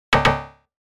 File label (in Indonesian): salah